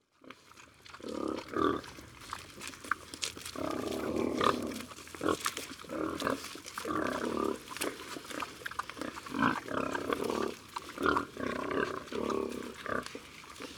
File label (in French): Le repas des cochons, Ambiances Sonores d Ardeche; Silhac; Studio Les Trois Becs